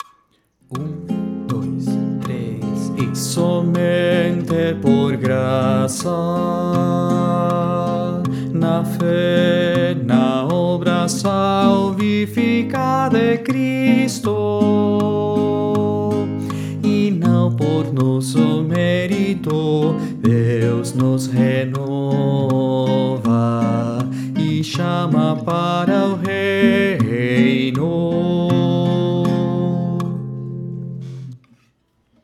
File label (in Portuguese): Base baixo; Confessamos juntos